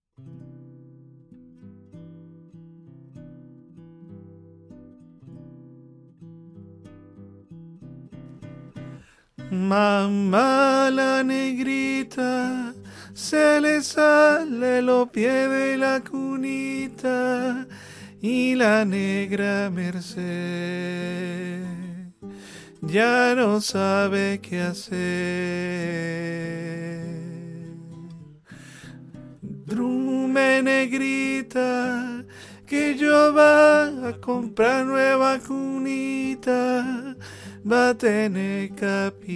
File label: tenor